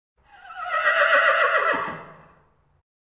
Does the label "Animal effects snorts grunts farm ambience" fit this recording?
Yes